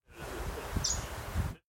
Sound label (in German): goldhaehnchen-laubsaenger